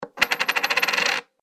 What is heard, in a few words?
Coin Placed And Spinning On Table; money; Andrew Fleming